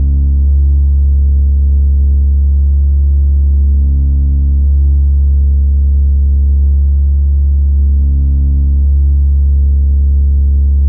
No Input Feedback loop deep low steady slightly fuzzy